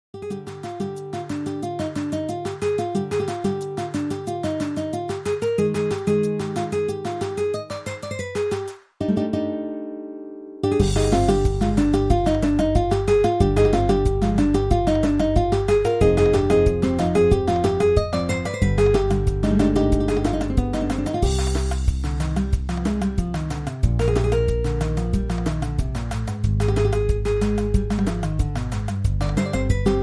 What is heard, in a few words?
Fondo Flamenco